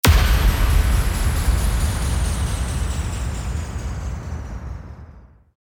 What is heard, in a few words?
IMPACT